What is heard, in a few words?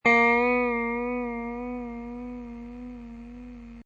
slow vibrato